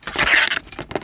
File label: Arrow Spawn; Timelock VR